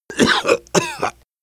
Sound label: cough, survivor voice biker